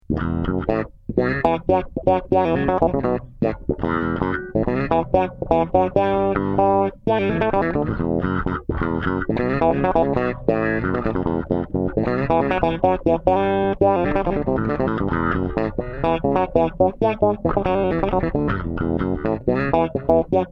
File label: Octave wah